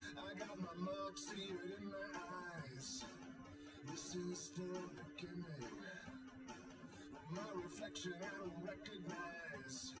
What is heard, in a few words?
wdrums liverec 250ms 1m sim; SiSEC08; SiSEC underdetermined